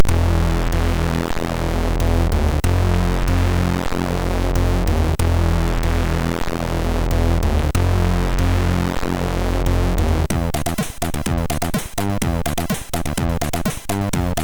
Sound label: Fast and Slow, MOS6581R3; hvsc; Froyd